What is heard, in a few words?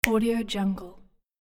snap fingers